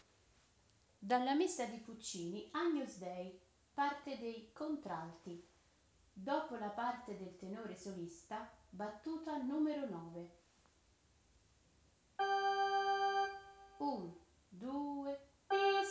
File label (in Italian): Agnus Dei messa contralti; Puccini